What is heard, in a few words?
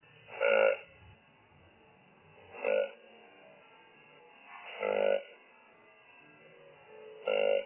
grunt